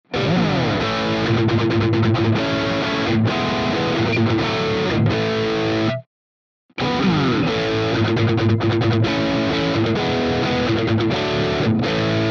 JACKSON AS Virtuoso SHP High Gain Riff

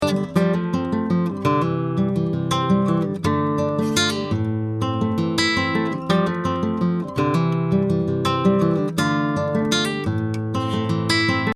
AC Guitar clean

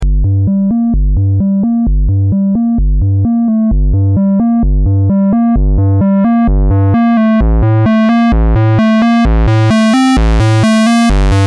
PulseWave PWM Modulation

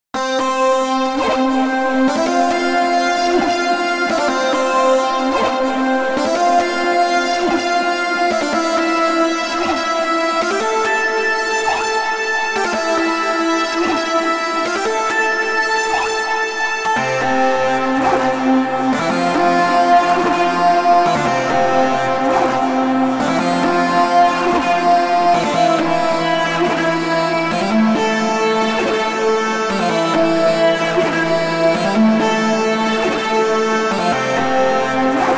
Pigments Evolving Mutation

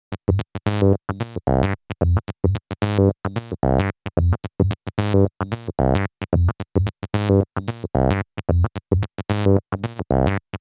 VCA Mod Noise; Urzwerg; Nanozwerg

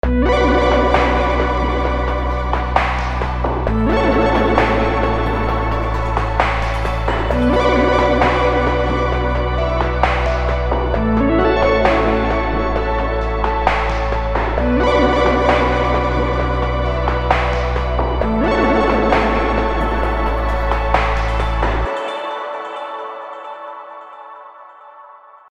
Avenger 2 Chill Steps; Vengeance Sound; Generative 1

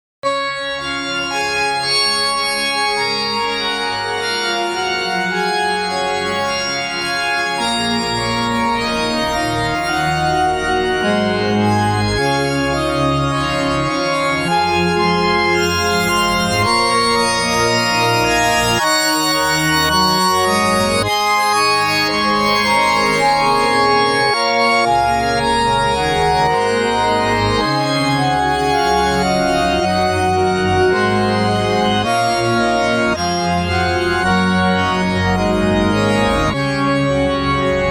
Cathedral Church Organ

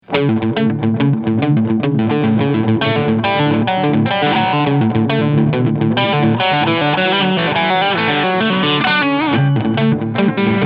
Morley AFX 1 Dist Echo 3 Uhr

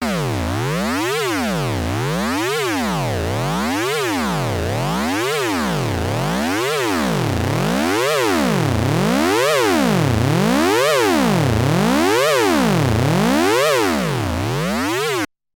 Behringer K2 Ringmodulation LFO VCO1

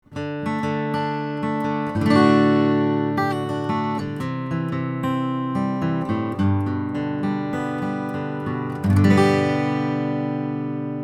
Acoustic Picking 35cm 15th Fret

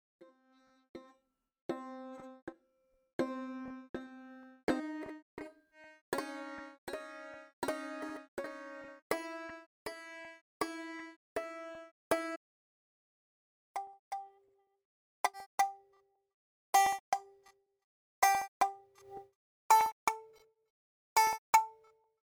Loops; Brunel

